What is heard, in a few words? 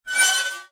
chariot Creak